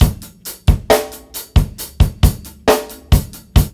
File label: DRUMLOOP, Ragga & Dub Reggae